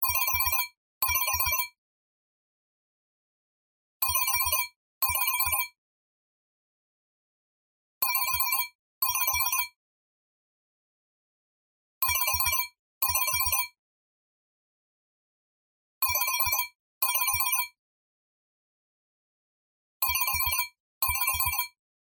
iphone 6s quick bell ringtone

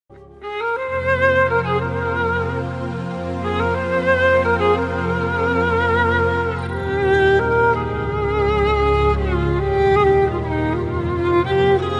violin solo ringtone

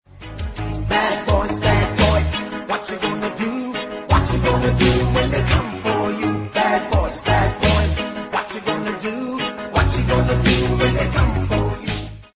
ringtone; iphone 4s

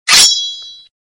sword notification ringtone

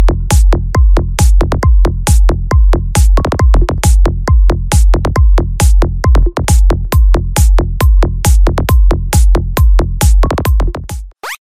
alien ringtone; iphone 7 plus

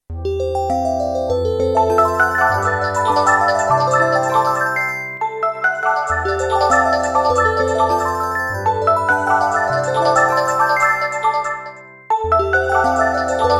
morning alarm ringtone; iphone 8

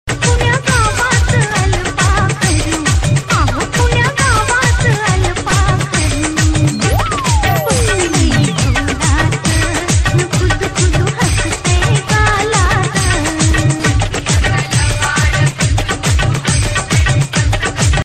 marathi ringtone

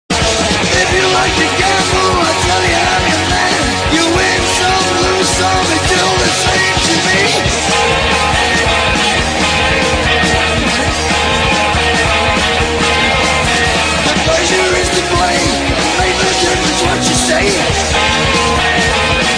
iphone 6s remix ringtone

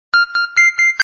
click ringtone; cherry mobile

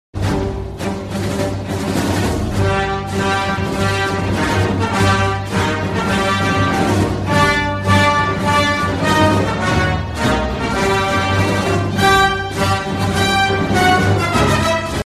huawei remix ringtone

